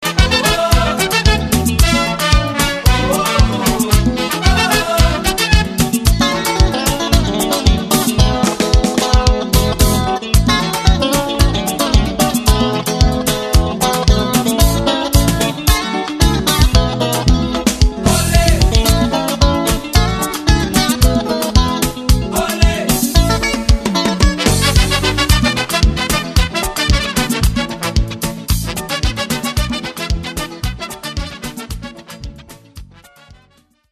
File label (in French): musique Antilles